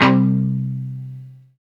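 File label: guitar chunk mute; 90's Sample CDs; Club-50 - Foundations Roland; Funk & Mute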